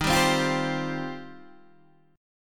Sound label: D sharp 6th Add 9th D sharp Guitar Standard; strummed; JGuitar